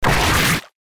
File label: ax slash